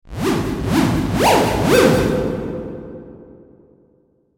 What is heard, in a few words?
Twisted Theremin; Miscellaneous Cartoon Sound Effects; unusual